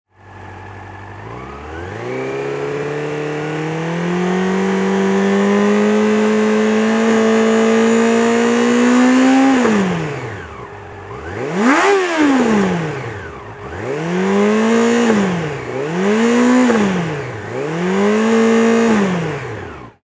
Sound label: nc42 idling, cb400sf